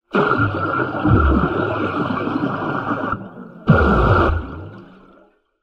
air cannister spray release underwater short burst; industrial